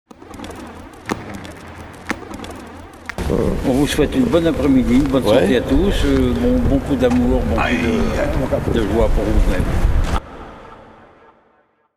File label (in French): beaucoup de joie